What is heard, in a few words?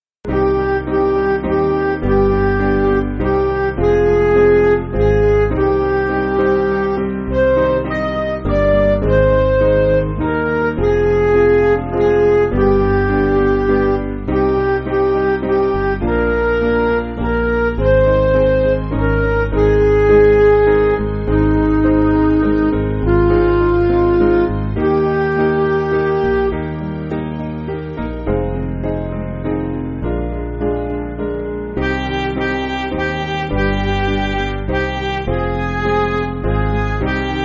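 Midi, Music Backing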